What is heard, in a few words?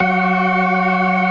STOPS, ORGAN